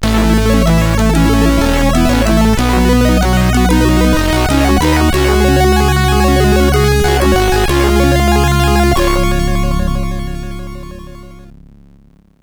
Small Air tune, sid CSG8580R5

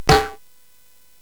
SFX; Narco Police; hvsc

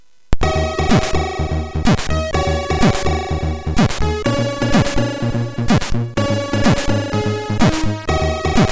Blob, MOS6581R4; hvsc

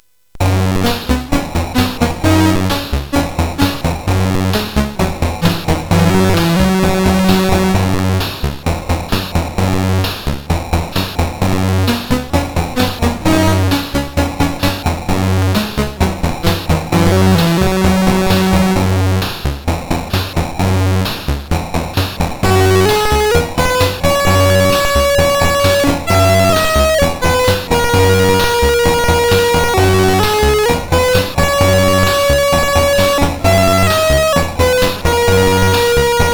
Synth Sample III, CSG8580R5; hvsc

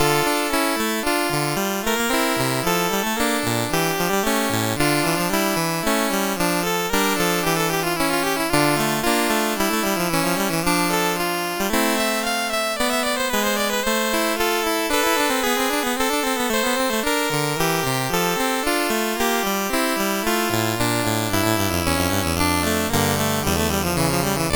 Fantasie, MOS6581R2

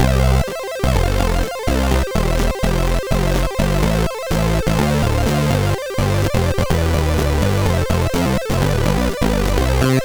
Xmas Song sid CSG8580R5

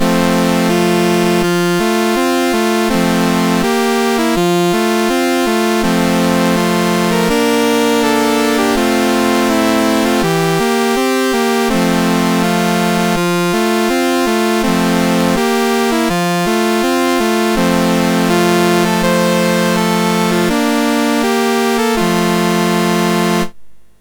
Bridal Chorus, MOS6581

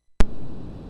sid MOS6581R2, GAMES; Face Off

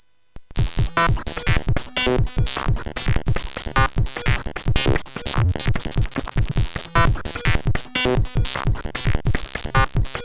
Ode To Metal, CSG8580R5; hvsc

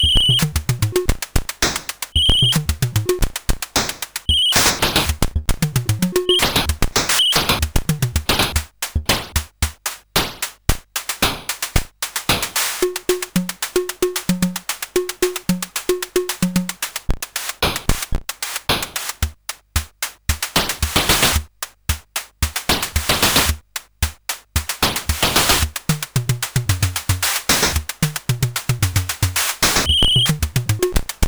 sid CSG8580R5

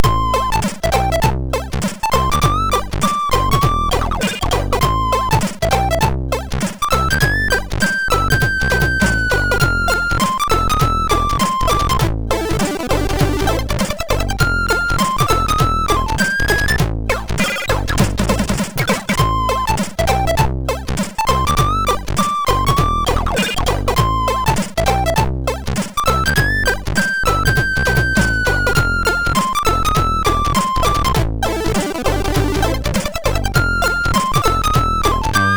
Swing, MOS6581R2; Sister Swing

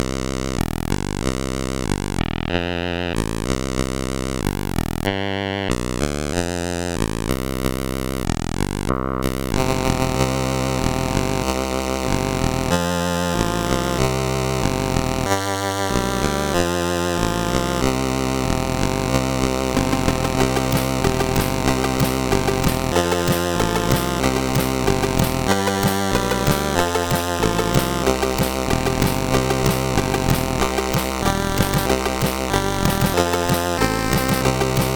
Blues, CSG8580R5

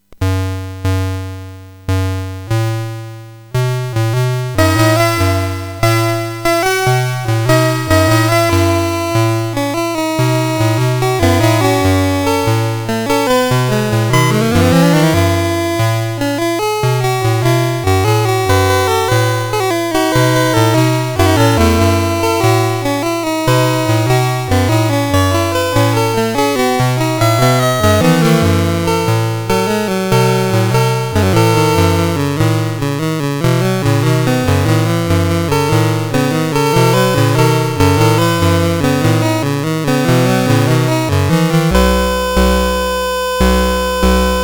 sid CSG8580R5; Doctor Dooms Revenge

